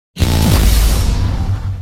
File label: ion cannon impact hit; nfs rivals beta audio